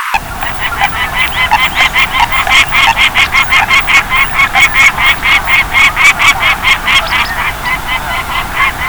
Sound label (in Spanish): Peregrino, Falco peregrinus